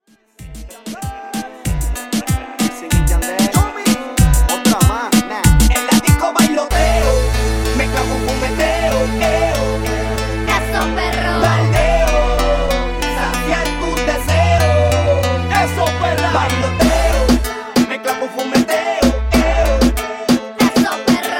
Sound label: Reggaeton 95 Bpm